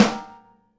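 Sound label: snare bottom DAMP, Live Snares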